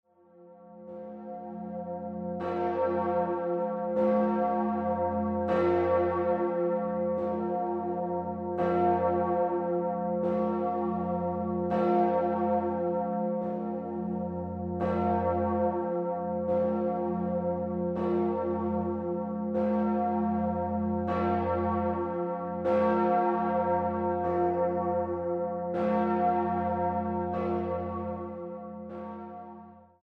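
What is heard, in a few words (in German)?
jahrtausendglocke, geläut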